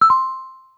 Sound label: Ping Pong, Yamaha DD-35